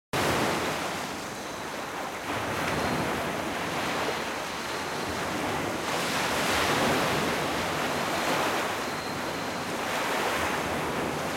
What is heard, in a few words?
Beach ambience; Angry Birds Time Travel; android gamerip 2019